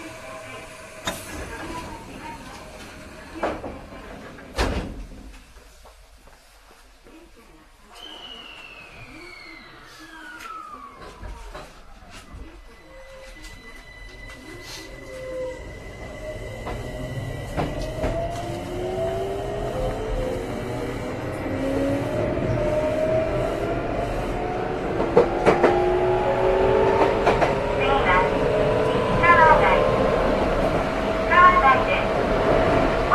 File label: tokyu 7700, train